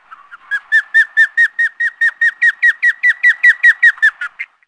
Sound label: OSPREY